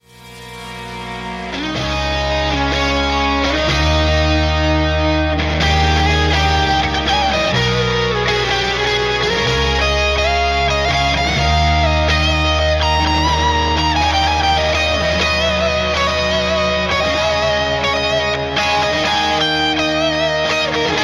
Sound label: rock